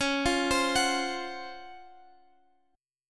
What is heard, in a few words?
C sharp Major 11th C sharp, Guitar Open F; arpeggiated; x,x,13,12,15,13